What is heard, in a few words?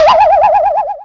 Wobble